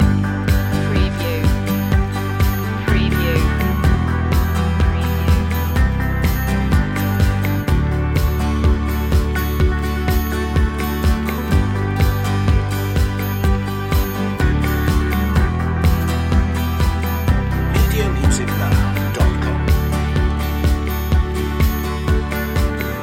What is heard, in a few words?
summer in june loop